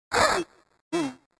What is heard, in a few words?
laughs